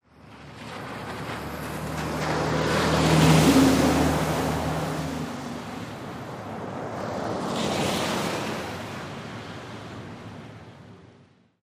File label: driveby puddle, Cars pass by, one with a loud muffler, several through puddles.; Traffic, Ambience Intersection, Street Car Bys